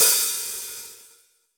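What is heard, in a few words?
open hi hat, Roland BK-5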